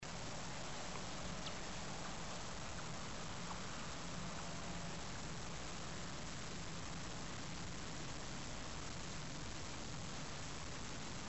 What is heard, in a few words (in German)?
stille am see